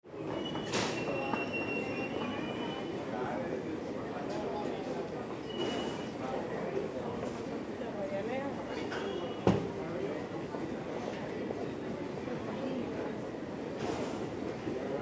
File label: airport atmo near baggage claim area